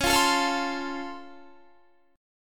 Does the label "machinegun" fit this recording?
No